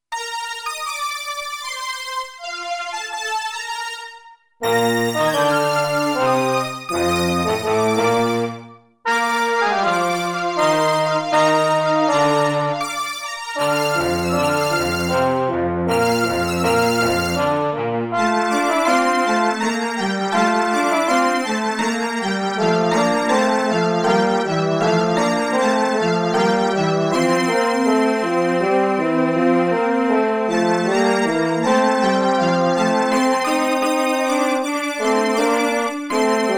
Cellos Violins Orch Brass French Horn Muted Trumpet Choir